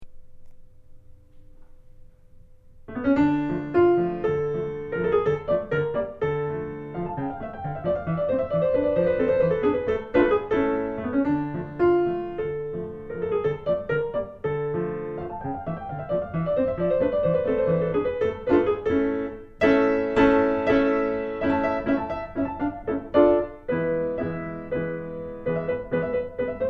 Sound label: Hungarian Dance